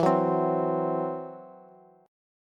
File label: E Suspended 2nd Sharp 5th, Bass; Esus2#5 strummed